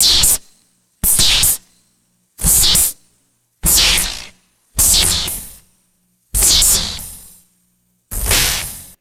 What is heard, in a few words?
short stylized retro electric zaps; electricity